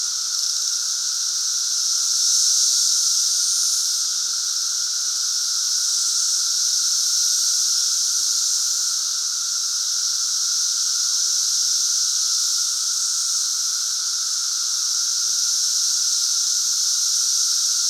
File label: Central Illinois Cicada Super Bloom